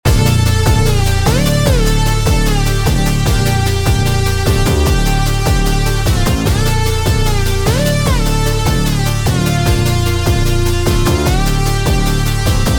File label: unwritten future bass; unwritten melodies; monosounds studio